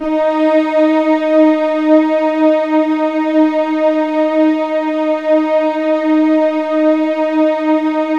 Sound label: Keypad; Standard Instr; Keypad 2